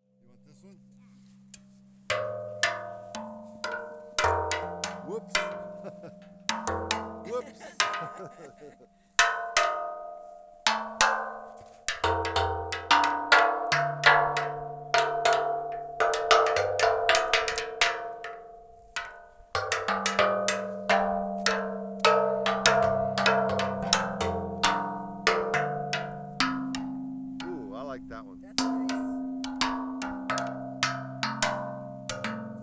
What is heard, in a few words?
playing a bell gong in the woods in the snow; Elizabethtown